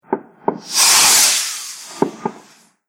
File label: Fireworks Rocket Whoosh And Background Bangs; Andrew Fleming